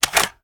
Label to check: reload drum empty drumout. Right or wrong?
Right